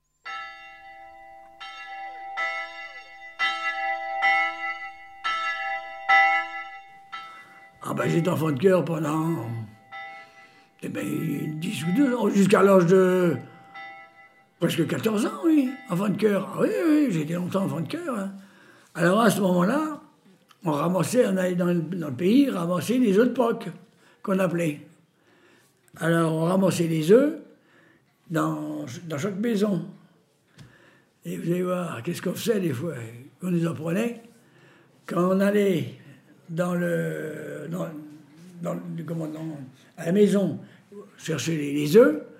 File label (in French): Enfant de choeur; bouville